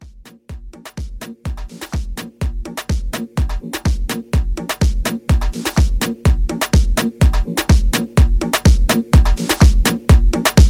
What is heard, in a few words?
Afro Re Drum 125